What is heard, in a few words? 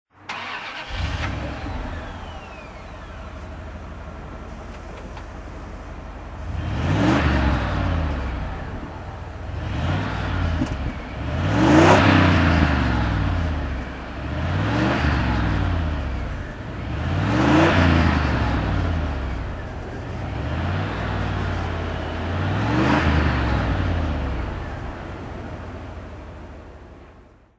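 bentley continental R throttle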